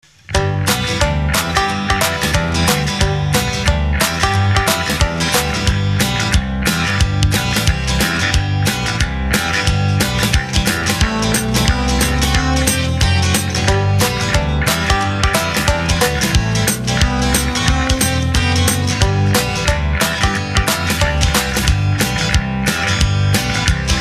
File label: Whimsical; Dyrene spiser